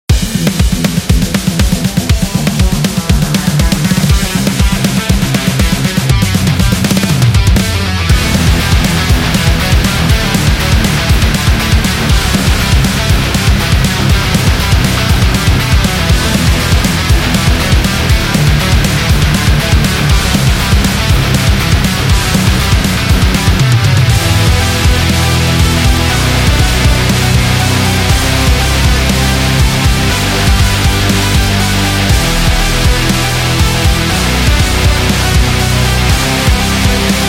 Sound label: Rock Promo; sport rock